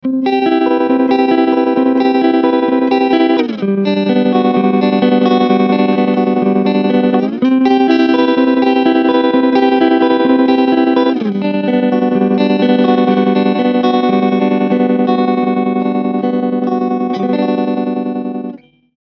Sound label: Varimolo